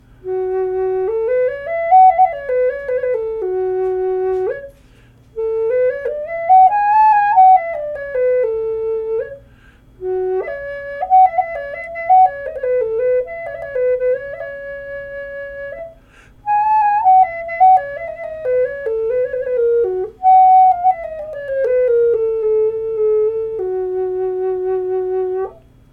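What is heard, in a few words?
F sharp 4, Native Style Flutes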